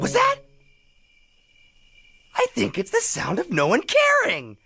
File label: sound of noone caring; taunts; hunters